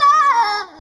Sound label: daayaahuhh; Truth-Hz - 1-Hit KO Sample Pack